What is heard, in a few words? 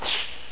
Soft Airy Swish